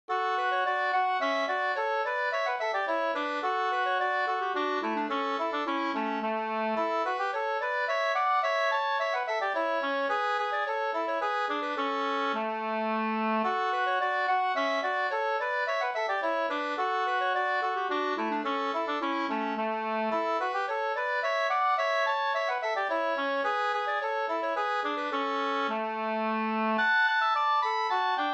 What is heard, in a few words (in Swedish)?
slangpolska 2st